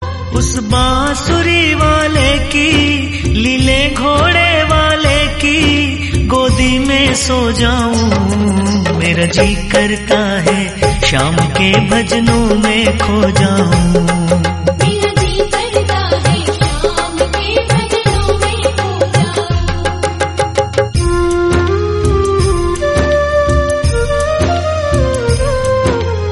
bansuri

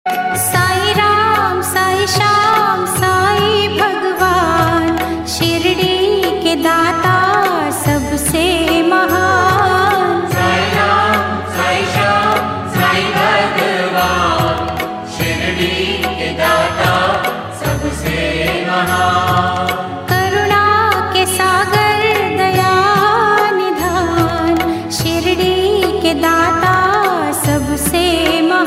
om sai ram

